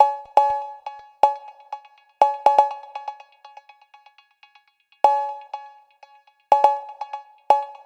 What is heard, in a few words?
122 BPM Cowbell; mmb studio soundtrack essentials